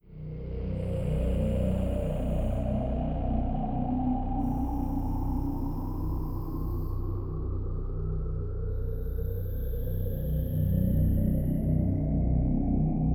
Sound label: falling star; ambient; Stellaris